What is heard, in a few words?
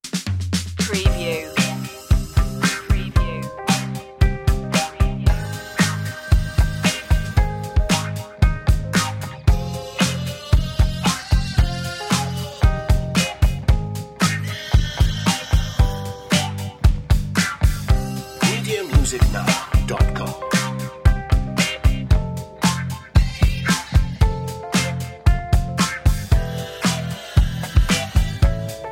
groovy vintage tune